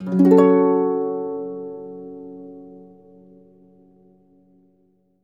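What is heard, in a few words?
harp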